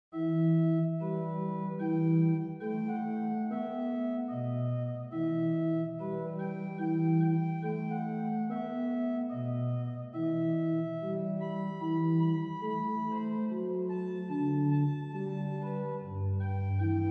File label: Recit Flute Affectuoso, OrganMusic; BeauvarletCharpentier; Gloria; Souvigny